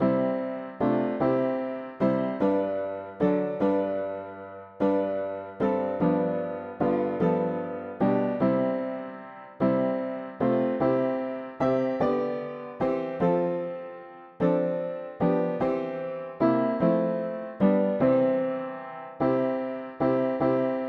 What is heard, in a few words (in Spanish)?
mono piano; hymns; Himnario pequeno